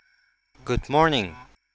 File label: linear, sound separation